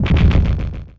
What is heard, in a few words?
Noiz; Sonic Foundry (Sony Creative Software); Esoterik Beatz; Warsaw FX